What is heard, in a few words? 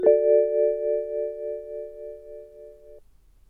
BMW Chime